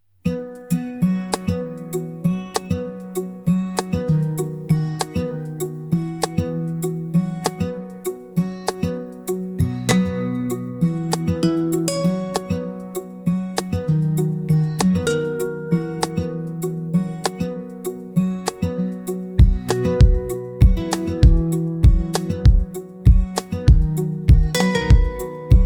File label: morning bright